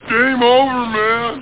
game over; colours

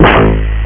Shield; Super Games Pak (1991)(Odyssey); spacewar

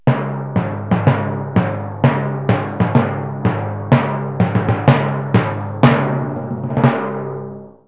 Timpani March; Music

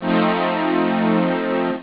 nuke, synth; da capo vol1

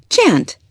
chant

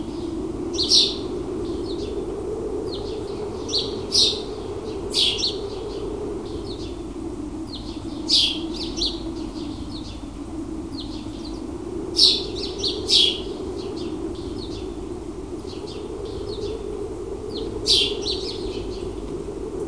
ATMO; TKKG 2; Todliche Schokolade

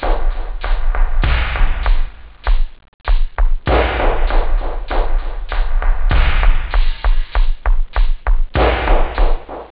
roll, loop, house

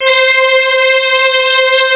STRING; Groovy Bytes: Behind the Moon

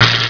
zap shot; duke nukem total meltdown

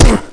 hit arg; Soldat

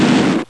HIT

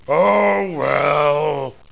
monst

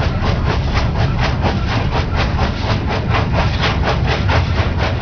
steam eng

